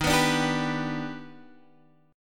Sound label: G Major Eb; G/Eb strummed